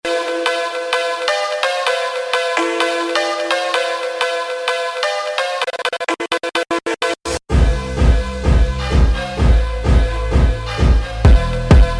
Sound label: Phonk House Version; ringtone